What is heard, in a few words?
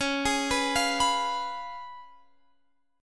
Db 7th Db down Guitar Half Step Down